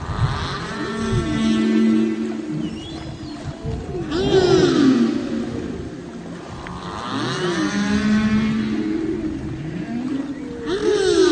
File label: Lone Singer Ringtone